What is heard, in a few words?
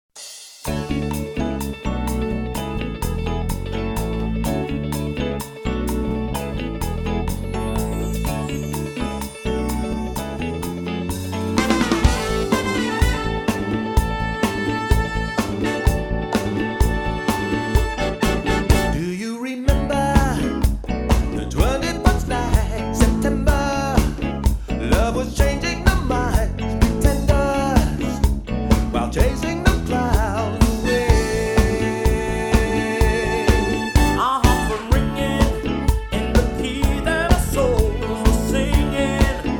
Variety Band; Atlanta